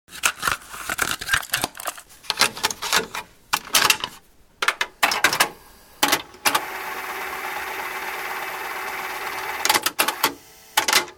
Mechanical whirr and clunking of an audio cassette tape rewinding; Mechanical whirr and clunking of an audio cassette tape rewinding and fast forwarding with sound of cassette being taken out; Tape Player; Cassette Player Sounds